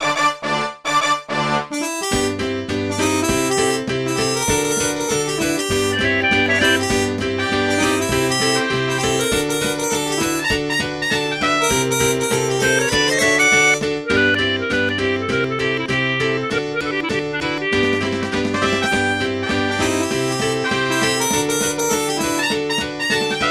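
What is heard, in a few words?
Bonus Music, MIDI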